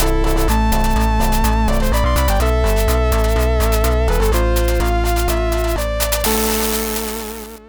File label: music beat level